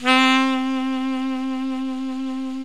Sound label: BREATHY SAX; OMI - Universe of Sounds